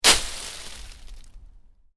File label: Kick leaves, Shoes: sneakers, kick pile of leaves; Miscellaneous Impact; Stuart Duffield